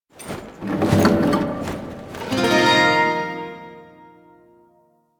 Shawzin Equip; Warframe; Duviri Shawzin

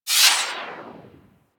Corpus Modular Skate Stride; Warframe; Corpus Modular Crewman

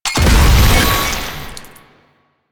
Proto Infested Maggot Explosion

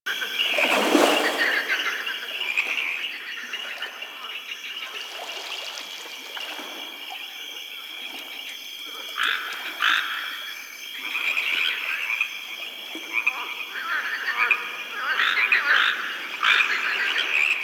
Exterior Lake Critter Loop; Warframe; Duviri

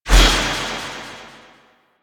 Alt Throw, Spear Gun; BE Ferrox

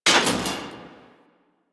Missile Launcher Mech; Weapons; Warframe; Railjack

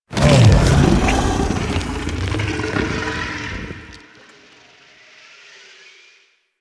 Monster Door Unlock Roar; Warframe; Infested Eris; Monster Door Key

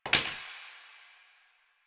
Plastic Broom Drop; Warframe; Ambience; Nineteen Ninety Nine

